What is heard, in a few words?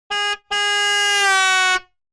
Truck Horn Pass By; noise creations